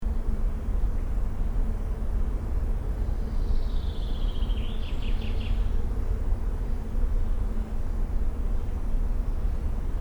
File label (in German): Industrie